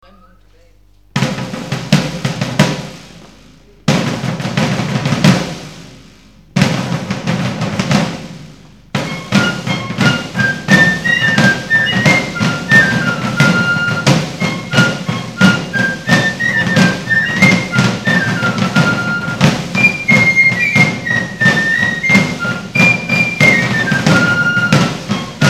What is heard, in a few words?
british march